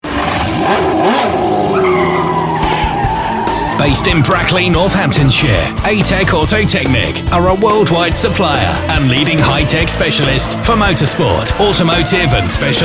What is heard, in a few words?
Jingle